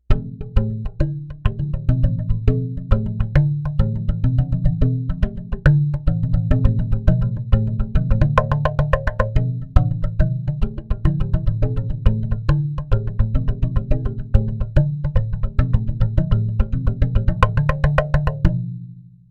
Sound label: Hand Tapping, african tongue drum